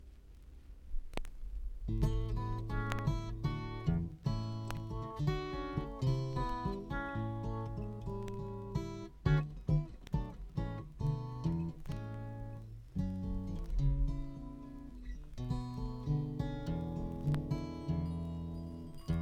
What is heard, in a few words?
Instrumental